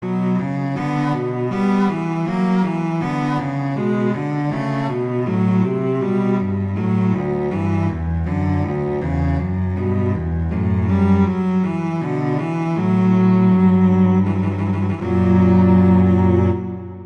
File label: Fantasia 5 Andante; Telemann; Fantasias